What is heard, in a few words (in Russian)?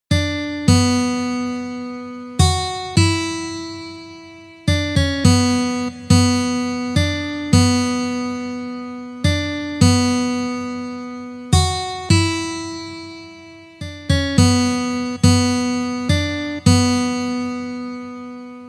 taby vstupleniya